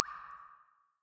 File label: Droplet